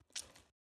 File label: squishy short; soundscrate